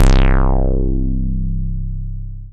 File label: Dance Floor Bass, Multisample Basses